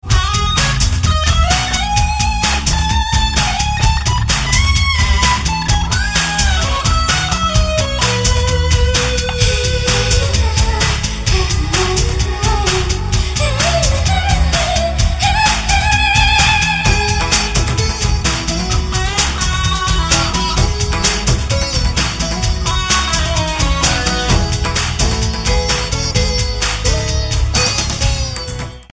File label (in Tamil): Bgm